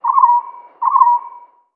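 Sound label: animal nocbird distant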